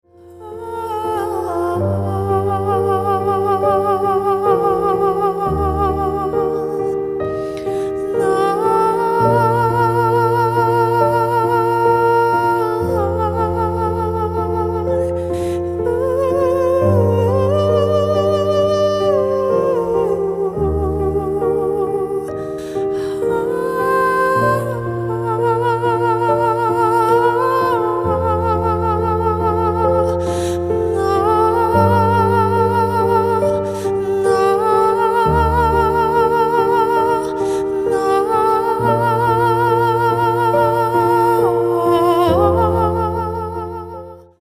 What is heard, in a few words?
healing sound